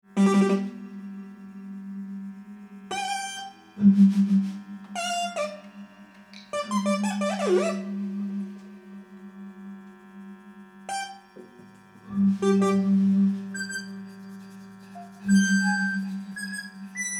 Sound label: Orchestra; Cube Orchestra; Rehearsal